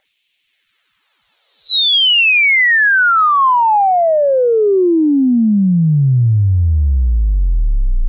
Downlifter

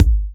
Sub Perc, Bassdrums; Vengeance Samples

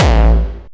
Bassdrums Hard Kicks; Vengeance Samples

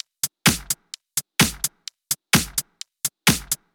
Drumloops 128BPM

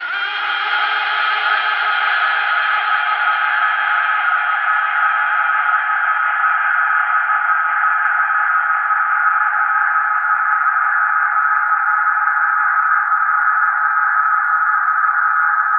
Reverb Athmospheres, FX Vocals; Vengeance Samples

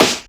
Snares & Claps; Vengeance Samples

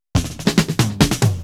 Loops Fills; Vengeance Samples

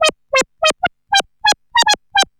Arp D Line, ARP Odyssey; Lines; synth; musicradar-synth-samples

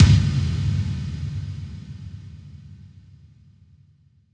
bassdrum reverb FX kick; Vengeance Samples

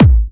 Bassdrums Clubhouse; Kicks; vengeance essential club sounds-3

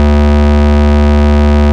Bass D, Root D

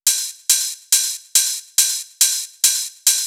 Open Hihat, 128 BPM; Rap; Vengeance Samples; Vocal-Hook Kits; Song Kit

